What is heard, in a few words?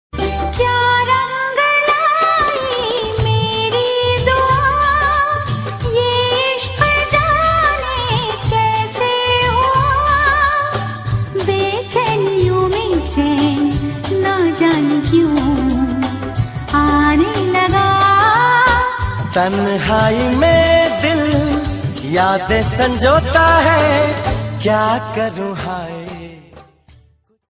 love ringtone, hindi ringtone